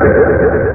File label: boom alien